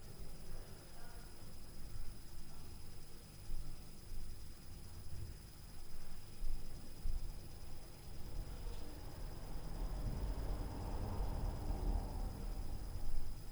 Car + Upstair LF + Smashing Door Noise, Street Noise